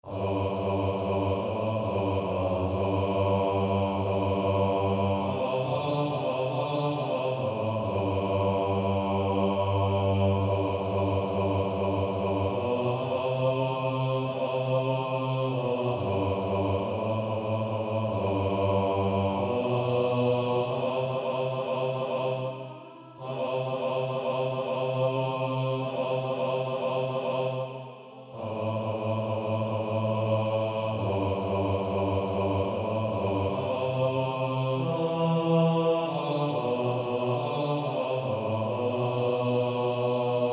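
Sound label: Male Choir, bass 1, Practice Music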